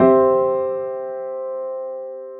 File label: chord C